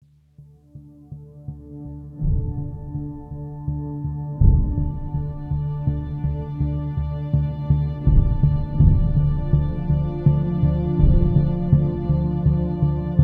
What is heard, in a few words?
Env Residential; The Dark Knight Rises; Android, iOS